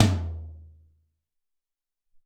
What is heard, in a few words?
Big Tom L, Alesis SR-18